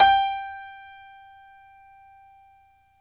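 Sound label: piano, Grand piano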